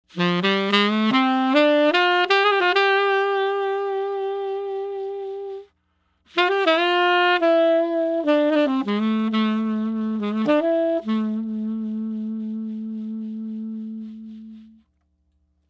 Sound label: selmer tenor neck; oleg